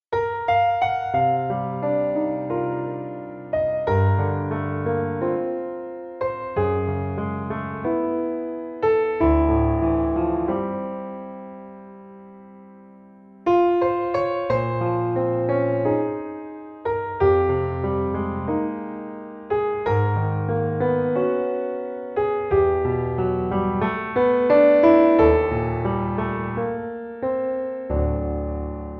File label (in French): Piano seul; American TV Serie Ambiance